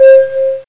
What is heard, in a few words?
bell